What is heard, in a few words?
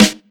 raw snare drum sample g sharp